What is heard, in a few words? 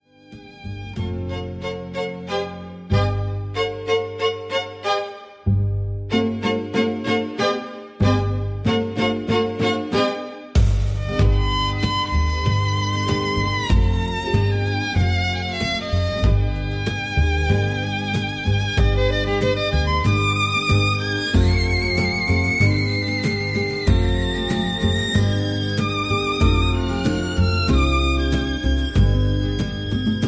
indian melody ringtone